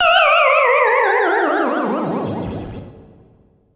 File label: Miss; Pac-Man Championship Edition 2 Plus